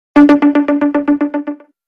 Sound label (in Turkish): sms mesaj sesi